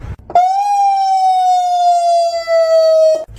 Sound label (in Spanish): sonido ambulancia